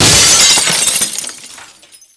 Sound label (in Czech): lustr; Mafie 1